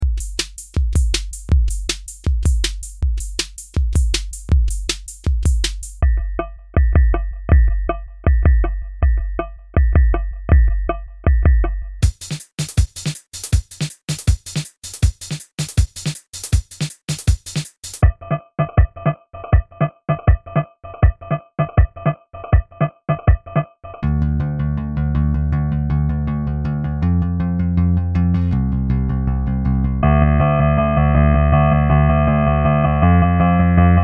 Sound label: singing piano